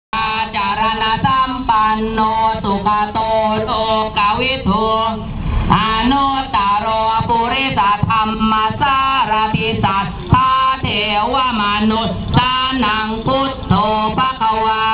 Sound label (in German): sanam luang, abendliches Fest- Sanam Luang; Bangkok; Bangkok 1; thai cambodia